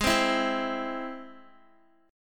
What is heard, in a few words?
G sharp Augmented G sharp Guitar; strummed; x,11,10,9,12,x